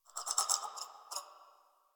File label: Tree Critters Angry; Ambience; Props; Warframe; Duviri